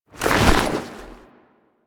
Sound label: Idle Screen Foley Fast; Warframe; Corpus; Corpus Lich